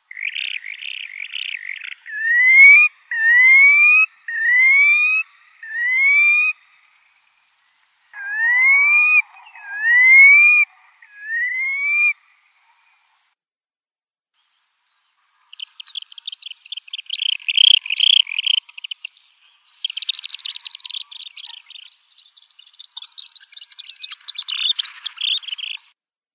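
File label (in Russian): Krasnozobik, curlew sandpiper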